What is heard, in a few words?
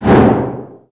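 Collision Interior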